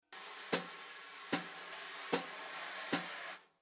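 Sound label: Rhythm 4 beat Brush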